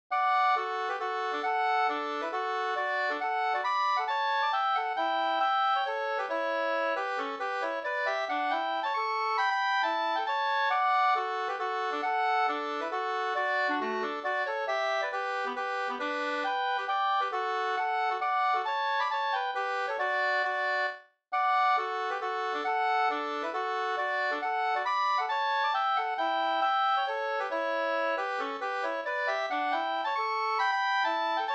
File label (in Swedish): mazurka, 2st